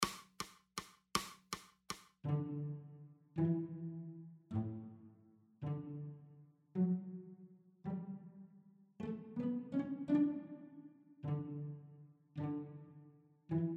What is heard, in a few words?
cello